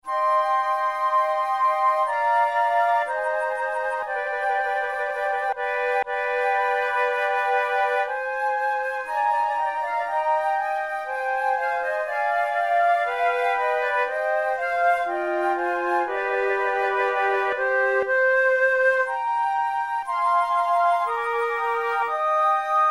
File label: flute sonata adagio; Mattheson; flute tunes